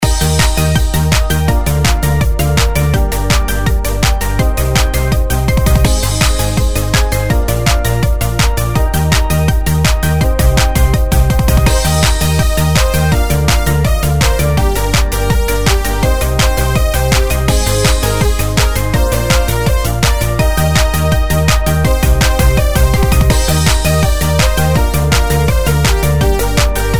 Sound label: reelspin fg; Party Girl Ways